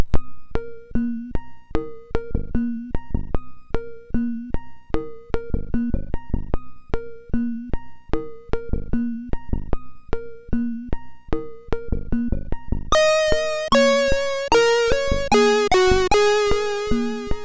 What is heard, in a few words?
sid MOS6581R4